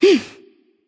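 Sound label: grunt attack, female; smite; voicepack; hera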